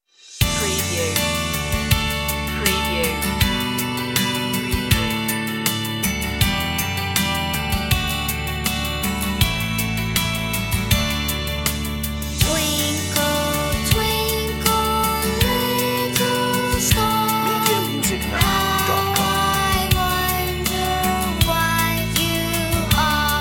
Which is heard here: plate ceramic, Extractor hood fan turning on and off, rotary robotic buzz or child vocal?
child vocal